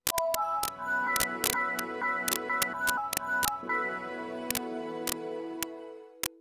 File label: Startup, Windows 7